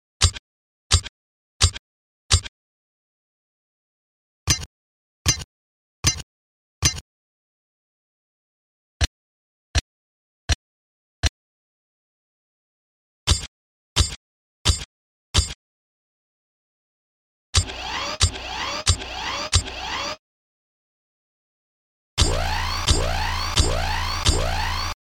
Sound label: Robot Footsteps